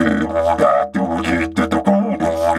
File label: Didge 93 BPM, Didgeridoo